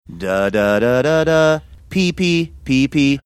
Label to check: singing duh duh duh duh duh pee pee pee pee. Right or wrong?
Right